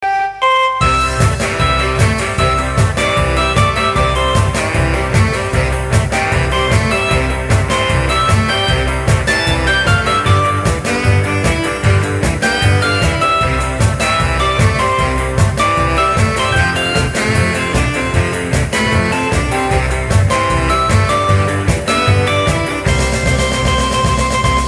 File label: Rock